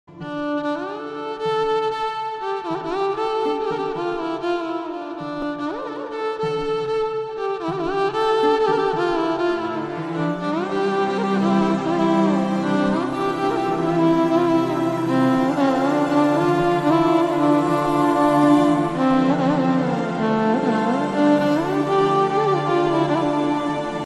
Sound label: violin